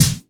clicky steel snare drum sound g sharp key

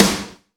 subtle reverb steel snare drum sound g sharp key

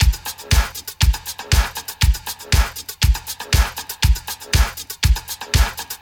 119 bpm drum loop A sharp key; drum loops

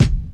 mid range hip hop steel kick drum e key; tuned drums e key

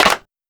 clap single hit f sharp key; percussion; tuned percussion samples

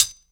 muffled foot hi hat sound g key; tuned drums

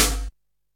2000s airy sizzle snare single hit g key

